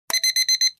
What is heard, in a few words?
clock alarm